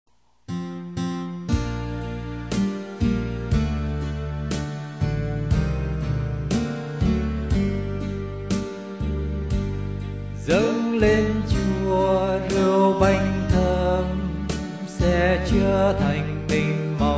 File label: BASS